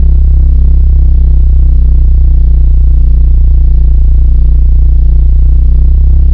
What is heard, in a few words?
pulse; ns machina